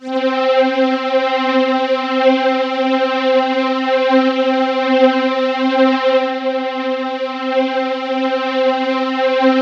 C3, SynthLine; 90 s Sample CDs; NiceBeats; SynthLine VOL-2

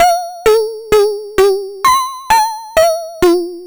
Juno-60 Arp, 130BPM

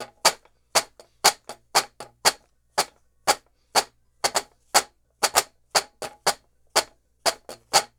Washboard, Appalachian

